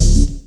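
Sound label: chord whoosh, Stabs, House; E Lab; XTc Files of House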